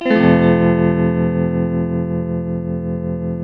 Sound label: TELTMUMAJ F#, TELE CHORDS, Guitars; Spectrasonics; Hans Zimmer Guitars VOL-1